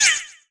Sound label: roll Player; HeavenStudioPlus; splashdown